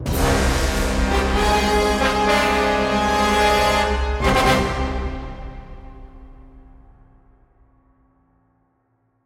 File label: Game Over Stinger